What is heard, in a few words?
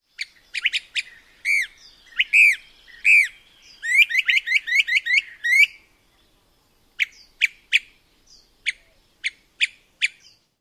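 various calls, Brown-crested Flycatcher; AZ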